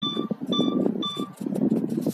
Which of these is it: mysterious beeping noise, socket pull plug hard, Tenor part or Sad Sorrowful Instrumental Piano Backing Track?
mysterious beeping noise